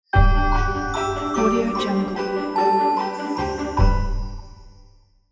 christmas carol logo; foddai

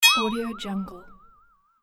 comedic boing

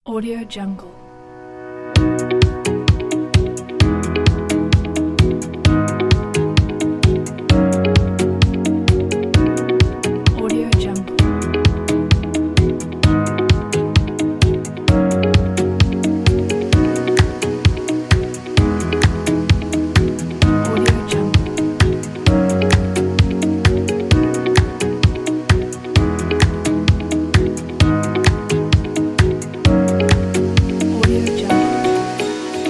corporate motivational music

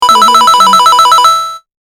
8 bit multiple coins win

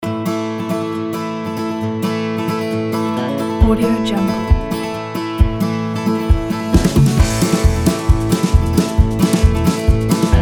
farm adventures

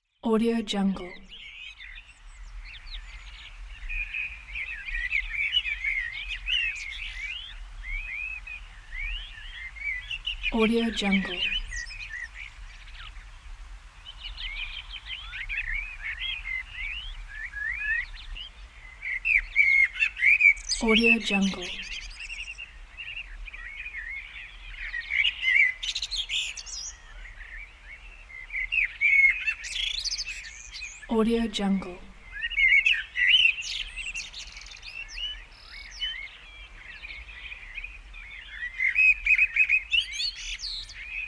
chirping birds

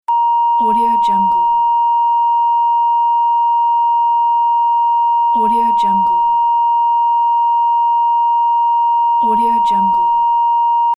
1khz reference tone

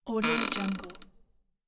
chair creak

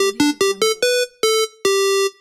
8bit win

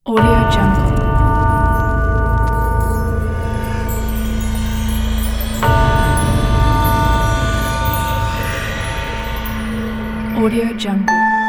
halloween

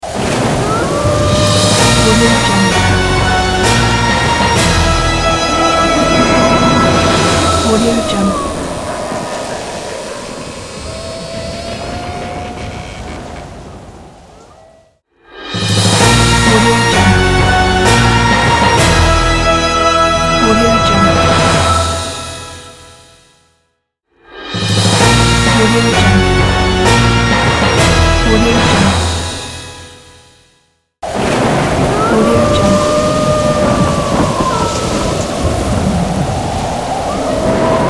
werewolf Frankenstein halloween ident

